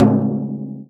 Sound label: FRAME, INDIAN PERC